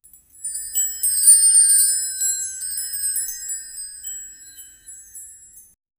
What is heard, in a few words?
sounds soft temple bells